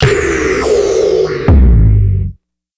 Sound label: The Fall, Electronic; Sonic Foundry (Sony Creative Software); Electronic Point Blank